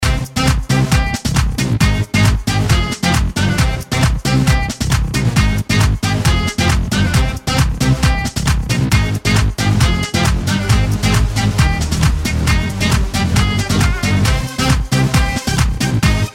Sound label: Latino Tropical